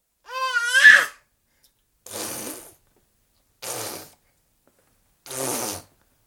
12 month baby boy, scream & blowing three raspberries; Baby Sound Effects; Babies; BBC